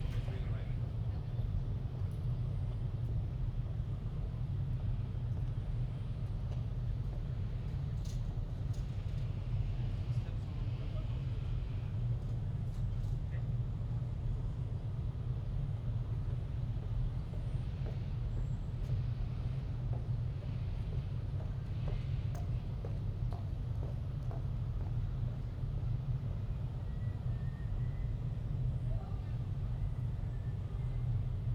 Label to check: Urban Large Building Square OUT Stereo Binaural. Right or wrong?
Right